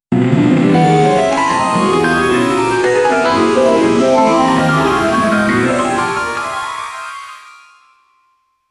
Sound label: dark game show television jingle